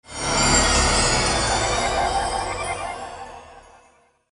recharge

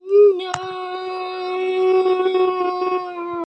scream phonk

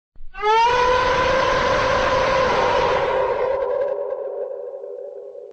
horror sound

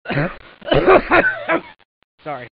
continuous cough

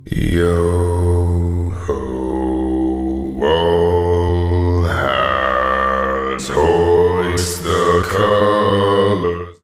yoooooohoooooo

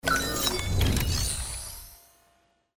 build open; death stranding

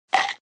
chomp chomp

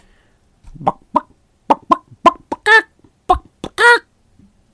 cluck cluck

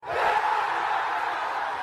dart

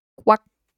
quack ente

